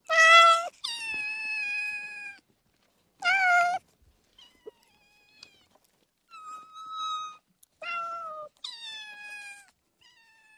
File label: Group Barn Cats Kit, animals cat